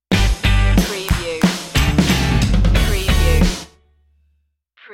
slam the door stinger

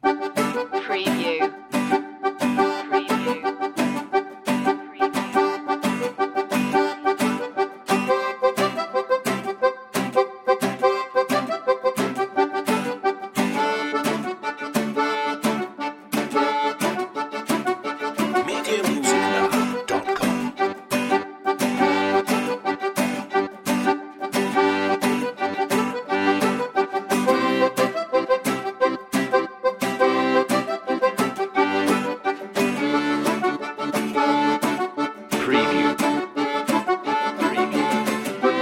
accordion fiddle guitar banjo

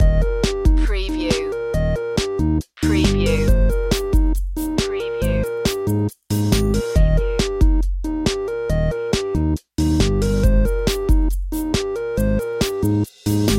electronic loop

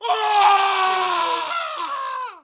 Scream Male

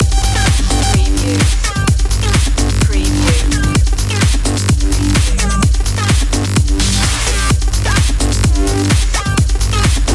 high drive loop

